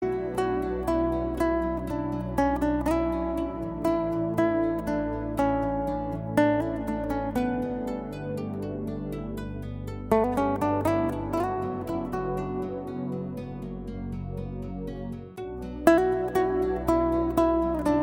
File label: Guitar